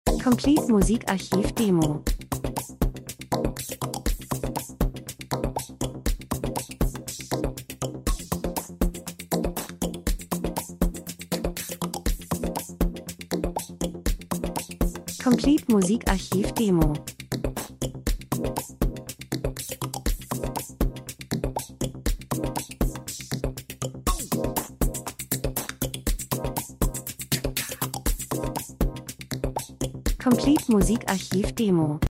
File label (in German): drums, rhythmus; Elektro Rhythmus Spannung Monoton Krimi Mord Verbrechen Nacht Kalt Aufmerksamkeit Spielfilm TV